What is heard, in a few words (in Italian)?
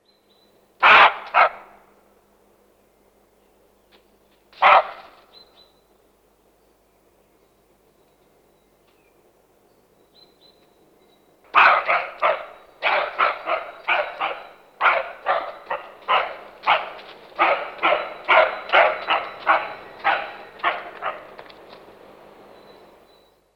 Capriolo Allarme; Versi Animali; Il Forum della Fotografia Naturalistica